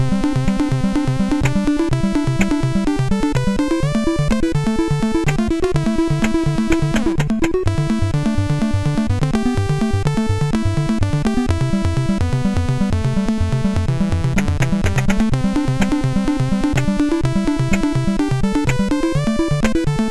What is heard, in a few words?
sid, MOS6581R2